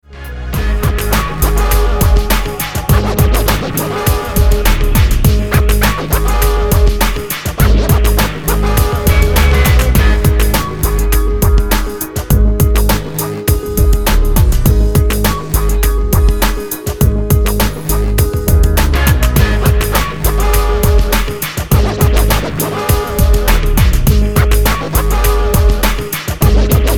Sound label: scratch dub